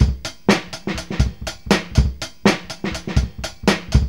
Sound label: SEXY 124, Funky Elements loops; Zero-G